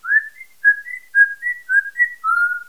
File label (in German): Pfeiffen